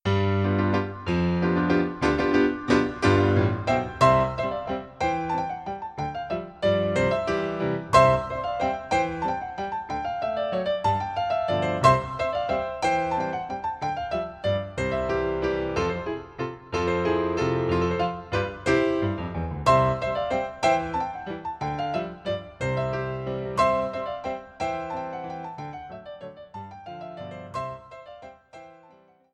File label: Sissones Spanish Dance; Moskovski